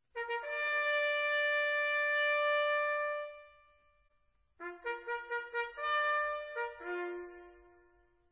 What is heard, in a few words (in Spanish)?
llamada musica, corneta